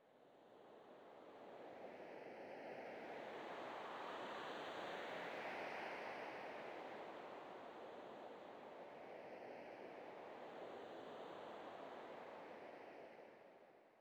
sting gentle wind; Rust

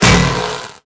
weak spot small

